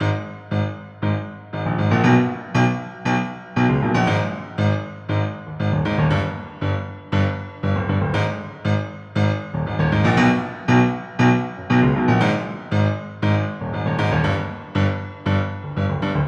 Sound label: piano, 118 bpm